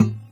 excite plucked nodamp

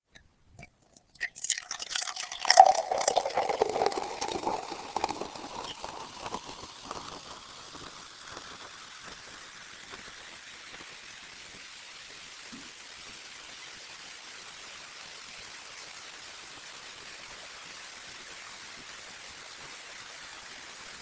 bottle

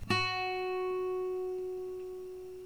B string 7th fret picked

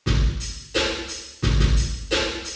drums reverb; dereverb

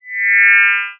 fm shrill

